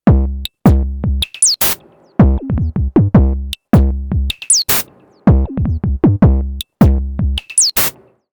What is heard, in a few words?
UNO Synth Pro X Presets